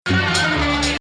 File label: Pseudo-Hijaz, maqam; Rast